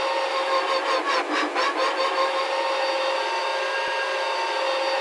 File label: Trans off low, Kart